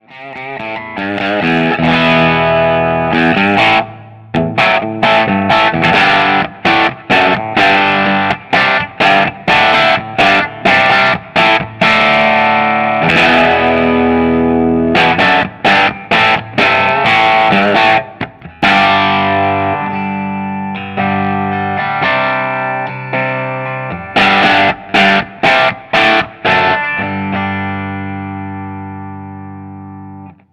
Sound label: Clean strat B pickup; Treble 14; Middle 11